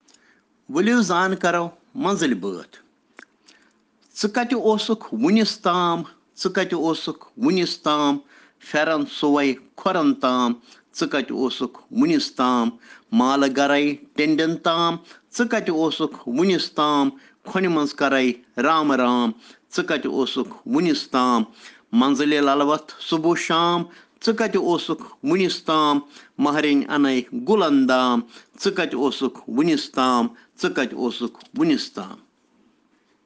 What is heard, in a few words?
Children Rhyms in Kashmiri